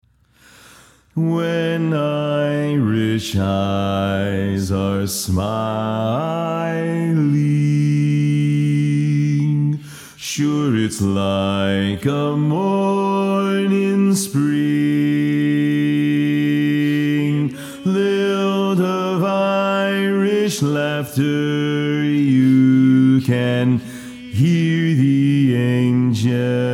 Bass, quartet

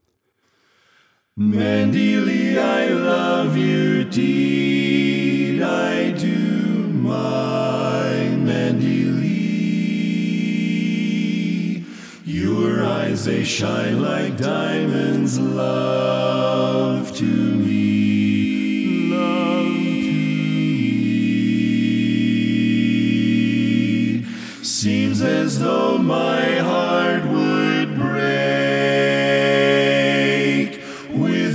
Lead Left

